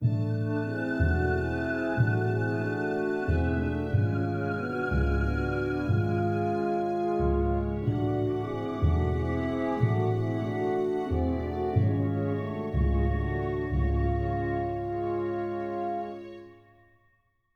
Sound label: Horn Transition; Season 1; Mission To Zyxx